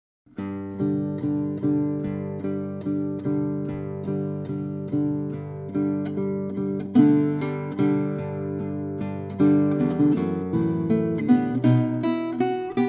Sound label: guitar